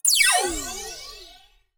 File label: Retro Charge Stereo UP